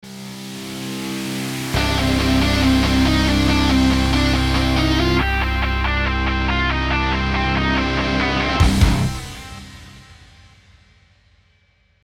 rock intro

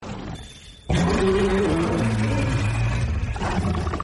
roar scream; nergigante; mhw

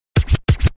scratch funkiness

